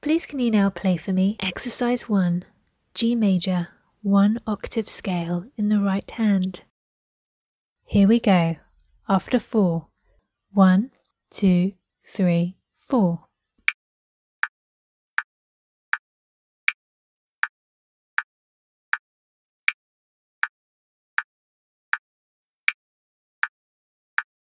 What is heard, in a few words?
g major rh